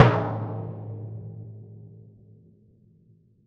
G#1 f, Timpani, forte; PowerFX; Downtown Orchestra; scales

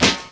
DR. LOOP; X-Static Goldmine 3

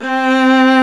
ARCO VIOLA, Solo String; E-MU Producer Series Vol. 2; More Studio Essentials; Composer; Solo String Combo

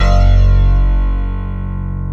PIA Old Smpl; Best Service Gigapack 1

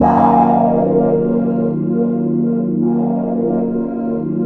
HOUSE MUSIC

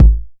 909 BD; Goldbaby Tape808 & Tape909; The Tape 909

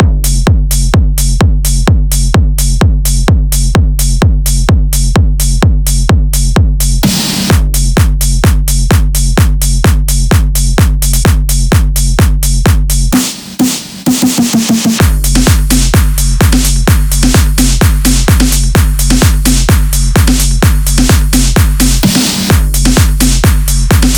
Drum Loop 128BPM Full G

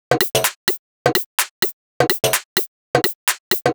Rollercoaster Top 127bpm, House Drumloops; 90 s Sample CDs; Zero-G House Fabrik Drumloops; ZG Drumloops

